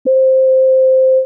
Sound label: trumpet